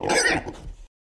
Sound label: Pig Screech Snort